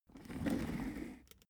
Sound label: office chair trundle slow short